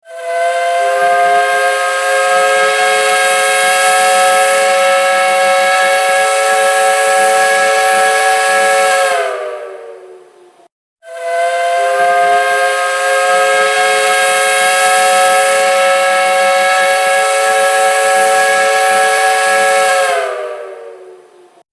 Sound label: Whistle long